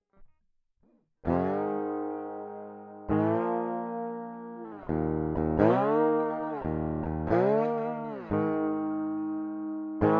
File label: Crunchy Blues